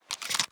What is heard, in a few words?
spas insert shell; weapons; garrysmod